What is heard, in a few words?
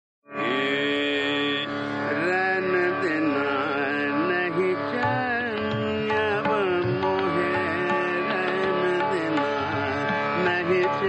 Nat Bihag D. Trital; abhinav geet manjiri; Raina Din Nahi Chaina